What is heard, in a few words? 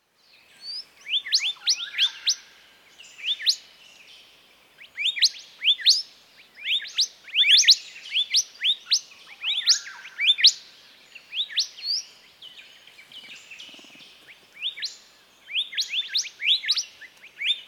Golden Whistlers in duet